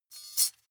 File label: midsize metal thin clank catch